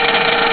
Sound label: mach